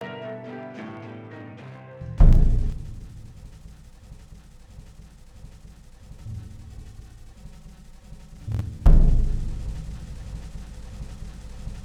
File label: Percussion Build